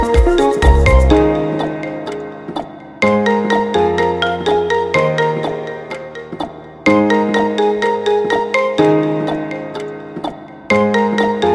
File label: marimba remix